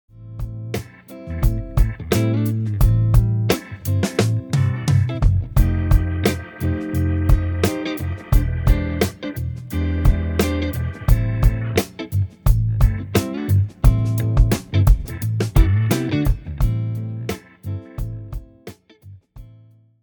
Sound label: Am Blues: Lydian Dominant Backing Track; This backing track was used in the Robben Ford Lydian Dominant YouTube lesson